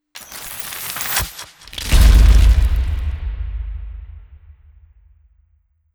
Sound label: Papersplosion